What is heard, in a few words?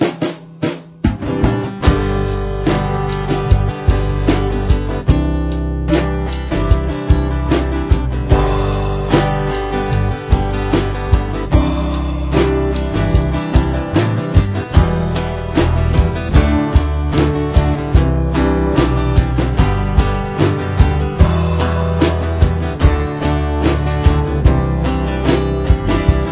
backing track